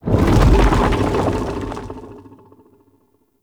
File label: Rock Rumble; Props; Warframe; Tau; Ambience